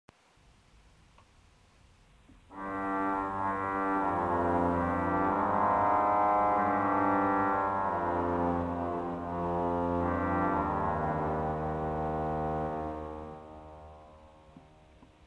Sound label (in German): Cornopean Reihe 16 8 4 Contrafagotto 16, orgel